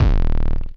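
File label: Distorted bass drum, roland mc-303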